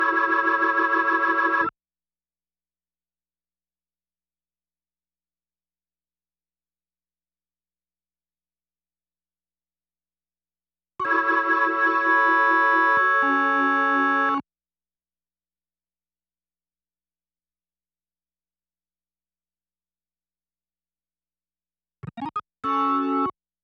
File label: Organ